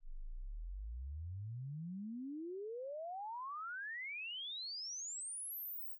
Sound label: ref -45 dBFS 20-20 kHz sweep